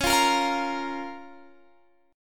Db 6th, Guitar, Drop D

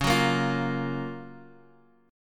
Gb Major 7th Db, guitar

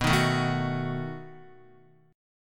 B 7th B down Guitar; B7 strummed; JGuitar

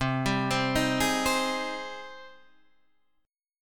C Minor Add Flat 6th C

